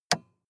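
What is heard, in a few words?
sports car interior switch on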